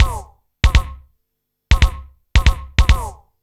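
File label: KICK ELECTRO 140, Techno Drum; Techno2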